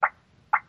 Car Alarm Series, Activation / Deactivation Chirps.